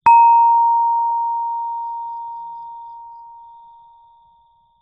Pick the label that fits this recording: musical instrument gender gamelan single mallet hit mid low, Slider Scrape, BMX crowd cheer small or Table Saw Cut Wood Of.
musical instrument gender gamelan single mallet hit mid low